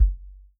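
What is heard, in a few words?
bass drum, Real Drum Kit; REAL DRUMS; Best Service ProSamples vol.43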